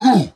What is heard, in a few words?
pain; arkhan